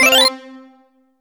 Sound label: spin